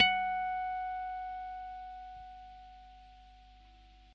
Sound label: B 7 fret, acoustic guitar harmonics